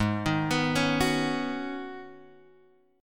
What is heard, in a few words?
G sharp Minor 11th G sharp Guitar Open G, chord